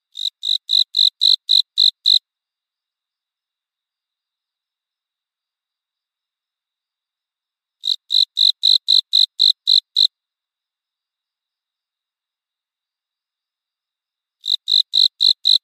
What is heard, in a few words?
japanese burrowing cricket chirping